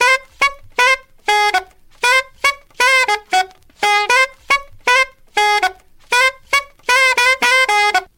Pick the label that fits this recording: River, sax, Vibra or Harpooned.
sax